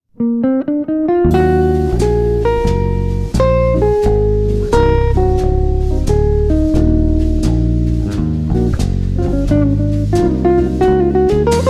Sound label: melodic minor